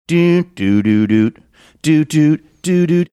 singing opening

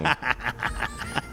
evil laugh

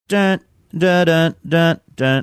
singing riff

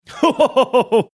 chuckling

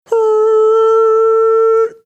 imitating sound line whistle

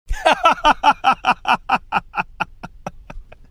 awesome laugh

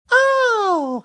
high pitched oh

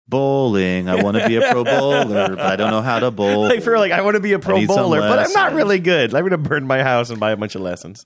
singing lyrics; about bowling game of thrones

